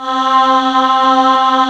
choir; 90s Sample CDs; Club-50 - Foundations Roland; Scats Choir

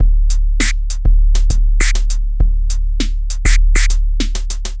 Noise Beat; Drums & Drones

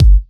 Kick, Kickdrum; Equipped Music; Loungin House

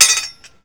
LATCH, Thrash Metal; SFX

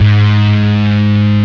G#1 GRUNGE B, GRUNGE BASS; AKAI S-Series CD-ROM Sound Library VOL-7

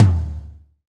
TOM TOM, Roland

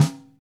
Radio King Snare pp Room; Sonic Foundry (Sony Creative Software); Vital Drums

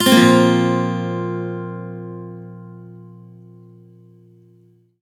One Shot Bb sus9 upstroke, Acoustic Guitar; Sonic Foundry (Sony Creative Software); Songwriter Acoustic Guitar Companion

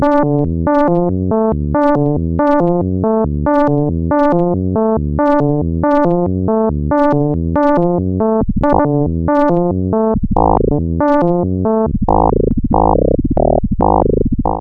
ARP 2600, SEQ; TECHNO-BOX

